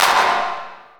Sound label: Clap, Gated Reverb; creative gate; musicradar